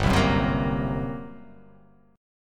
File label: G Minor Major 7th Double Flat 5th down, chord; strummed; JGuitar